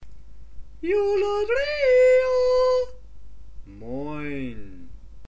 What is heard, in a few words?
Echo